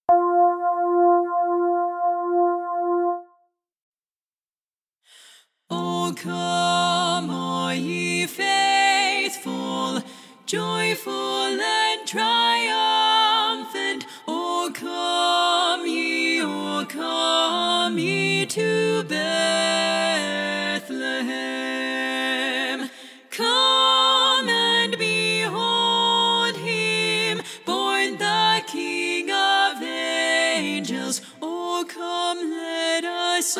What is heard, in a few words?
SSAA - Lead